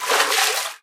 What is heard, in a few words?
water wade, footsteps